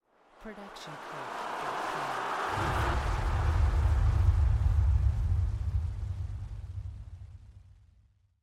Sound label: Noise Lifter; soundscrate